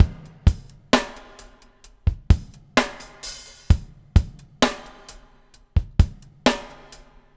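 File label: BUBBLE right; Spectrasonics - Backbeat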